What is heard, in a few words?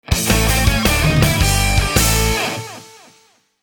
jingle, music; VirtuaTennis; CyberMycha 2002 May